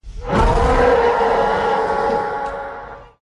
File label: hulk roar